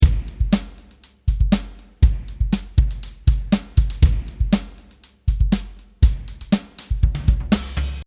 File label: Drumset Dry